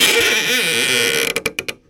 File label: opening old wooden door